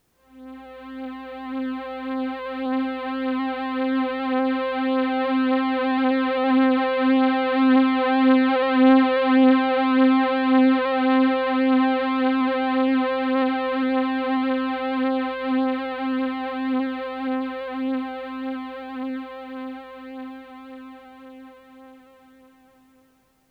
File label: Vintage Pad One Shot, C; Lofi